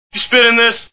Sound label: spit